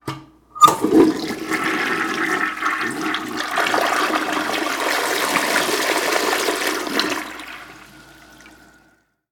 Smyvnoi bochok v unitaze smyvajut

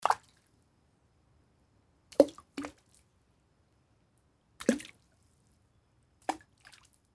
Kamni kidajut v vodu

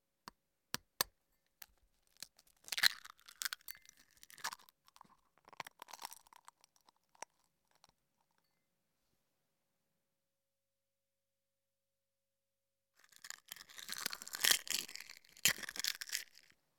YAicca razbivajut

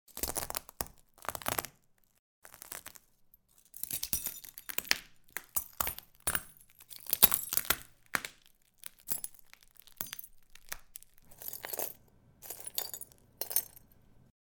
Topchut bitoe steklo

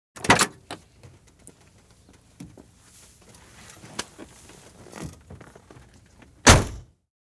Zahlopyvaetsya dver avtomobilya

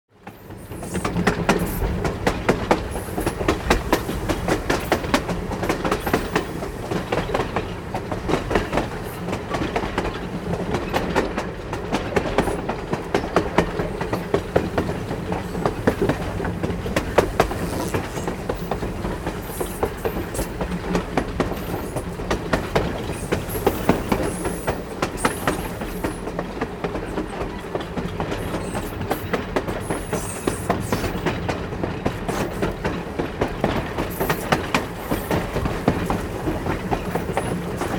Stuk koles

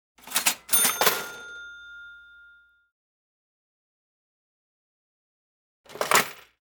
Kassa

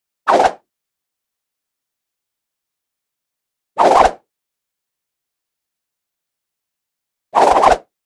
Zvezdu nindzya brosajut

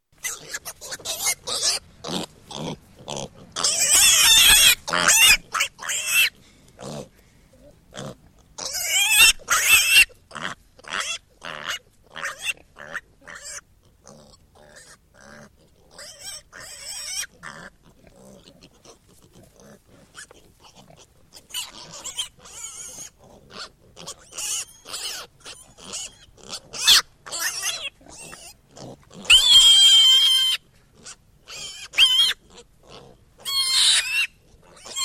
Porosenok vizzhit

